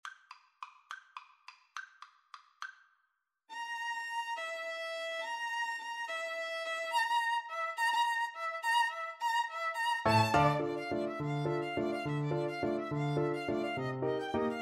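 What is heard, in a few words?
violin piano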